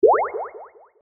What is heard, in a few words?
plop